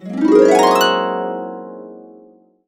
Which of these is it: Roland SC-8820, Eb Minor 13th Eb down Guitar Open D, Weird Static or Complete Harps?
Complete Harps